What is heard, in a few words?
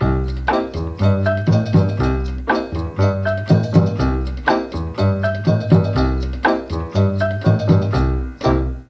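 G2 cha cha flute, mellotron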